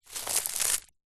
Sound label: foley dirt fine dust handful drop sprinkle ground